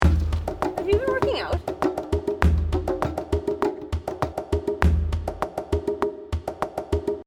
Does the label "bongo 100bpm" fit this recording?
Yes